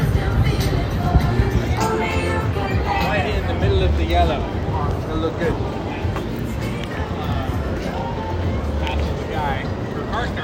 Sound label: bg streets sound